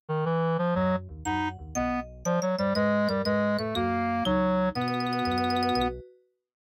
MIDI Animation stings

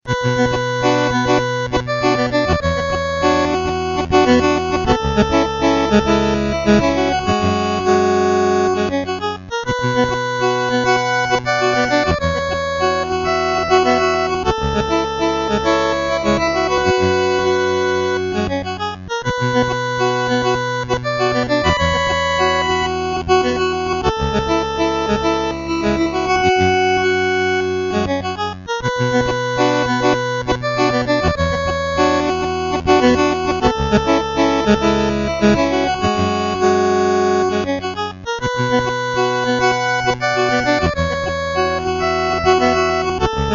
Level Theme; Gates of Zendocon

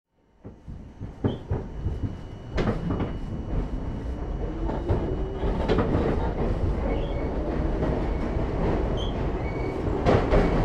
train leaving; Pain Train

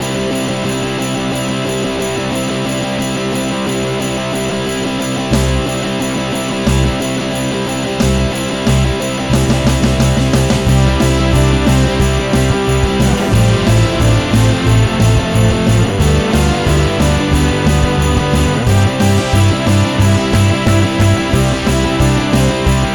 Jingle Bells But Awesome; I Wani Hug That Gator

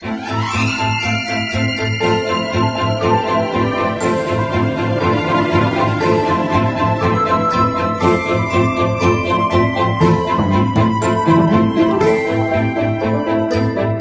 Retry Menu; Maria the Witch

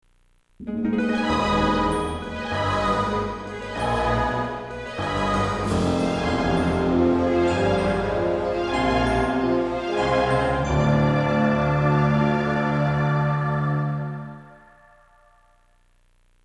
horse ride; infernal horseride; Indiana Jones and the Infernal Machine

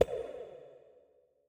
Tempo Drum Hit dead; Mountain SFX

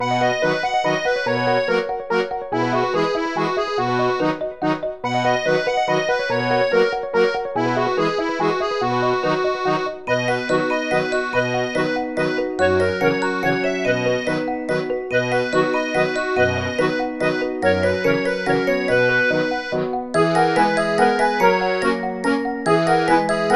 Town Streets Upbeat; peppa pig world adventures

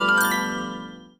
Level Normal; mahjong royal towers ios macos ps vita ps4 windows gamerip 2013

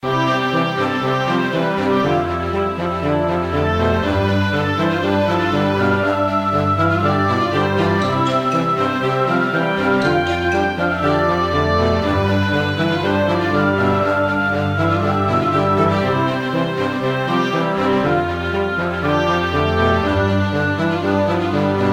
Retro Village Style

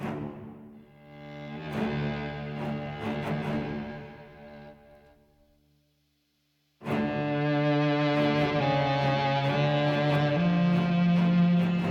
Action Chase 140bpm Low No Perc; Project Zomboid

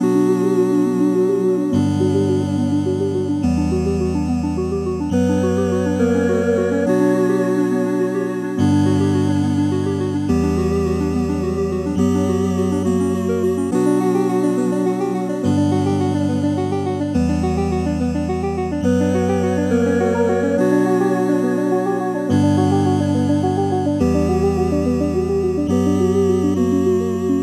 Fragment Loop; curse crackers for whom the belle toils switch windows gamerip 2022

